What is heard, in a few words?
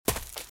Footsteps - Leaves - Run - Right; RadwinIsland